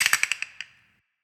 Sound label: Rattle